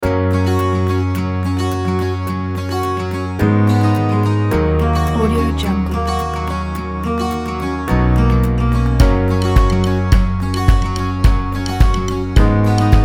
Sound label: folk